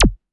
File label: Beam HiQ; Roland SH-32; Drumset 03